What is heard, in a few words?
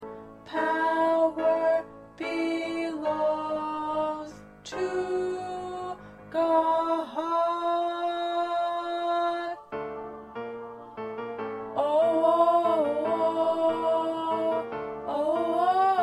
2nd Tenor